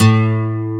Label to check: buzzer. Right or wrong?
Wrong